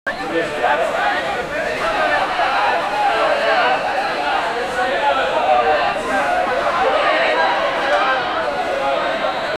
sonido de fiesta; party

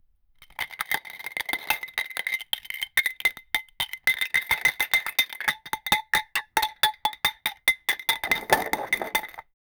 llenando un pote de crist, filling

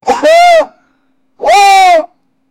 bostezo, exhale

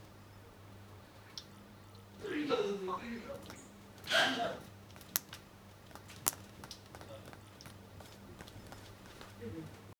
una persona escondida en, nervous

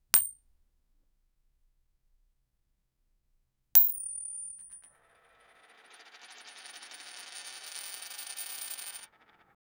moneda cayendo; coin

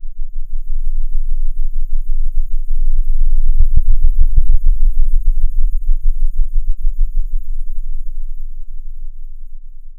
proyectil en vuelo un zum, projectile

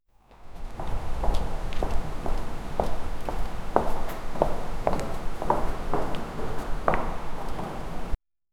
una persona caminando en, space

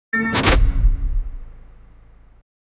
sonido para un logo

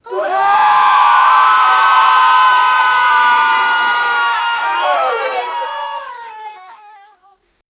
gritos de amigos con eufo, joy

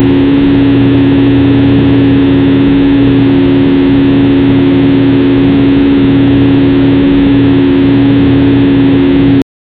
sonido de arranque de un, engine start

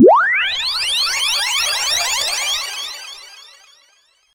rayo de luz magico

